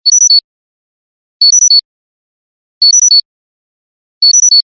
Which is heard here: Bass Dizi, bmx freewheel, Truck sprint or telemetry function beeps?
telemetry function beeps